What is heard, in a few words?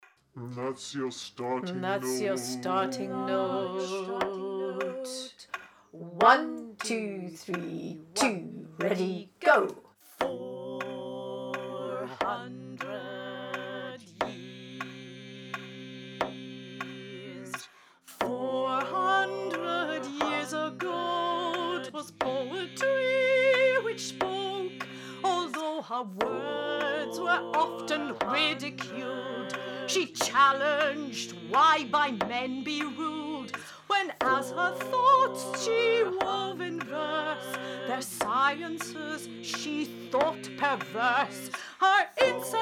Song VERSE ALL VOICES click; VERSE 1